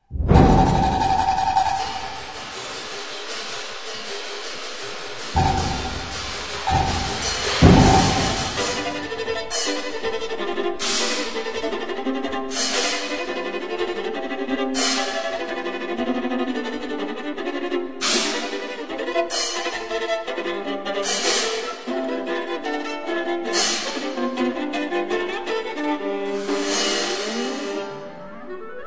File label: Chinese concerto